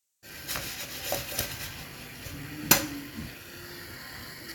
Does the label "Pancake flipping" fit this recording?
Yes